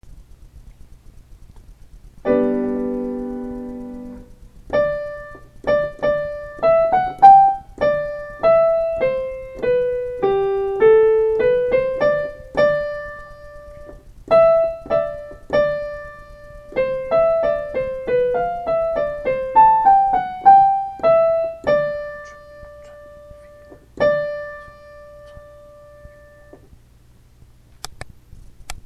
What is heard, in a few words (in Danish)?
overstemme solo